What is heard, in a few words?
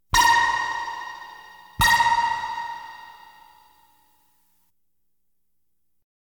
Science Fiction effects, Lasers, Light beams